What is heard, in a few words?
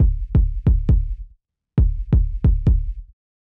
Beat - Kicks, Grime, 135bpm; Zero-G Sound Sense Grime Scene; Construction Kits; A Positive Vibe